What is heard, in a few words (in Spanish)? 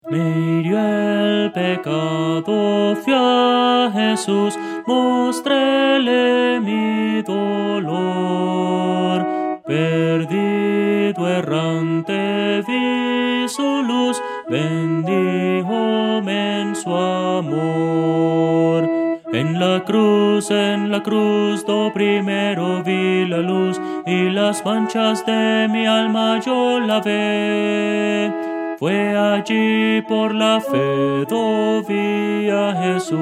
melodia